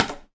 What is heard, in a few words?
dasani, Dasani Kit, ETHNIC and WORLD PERCUSSION